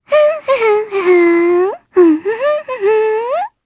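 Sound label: Vox Hum Japanese; Mirianne; kings raid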